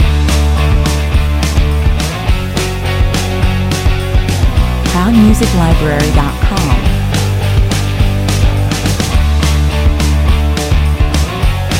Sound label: positive indie (loop 01)